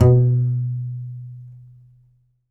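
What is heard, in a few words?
contrabass balalaika, Plucked Strings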